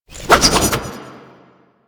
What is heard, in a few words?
Sword Spin; Warframe; Duviri; Duviri Dax; Weapons